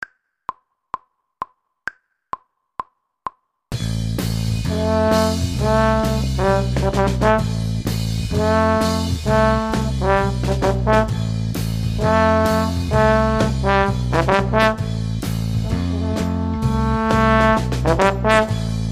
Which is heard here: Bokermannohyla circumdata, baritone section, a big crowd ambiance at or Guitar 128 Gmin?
baritone section